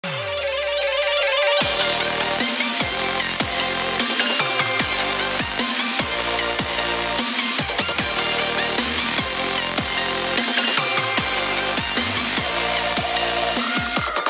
Low Cut, Low frequencies filtered on the DJ Controller; Modelling Filters on a DJ Controller